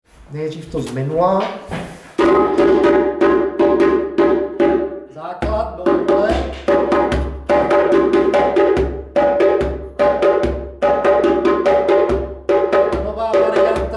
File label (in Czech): Bubny, djembe